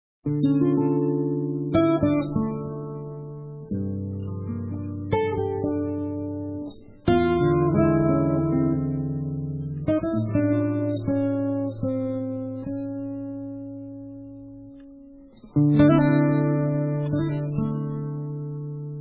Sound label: solo guitar